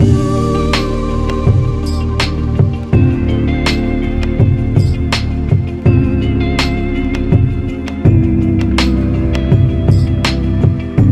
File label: Lofi Loop